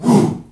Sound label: "Hoo", Shout; Spectrasonics; Heart of Africa 1